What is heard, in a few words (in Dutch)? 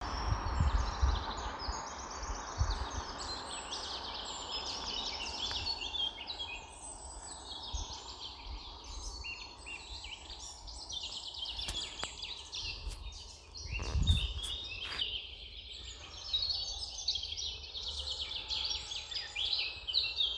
vogels amerongseberg